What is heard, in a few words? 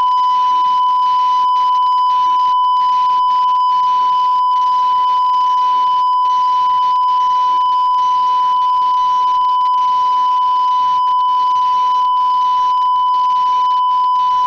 1053.00 am; dorohoi; kiwisdr